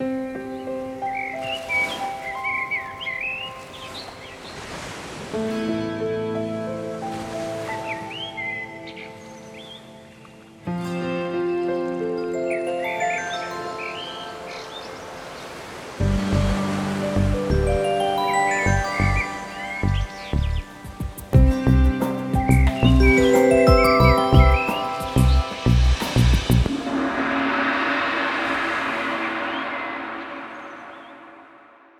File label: beautiful alarm; Alarms